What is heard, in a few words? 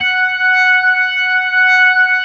F#5S, B3, Vintage Keyboards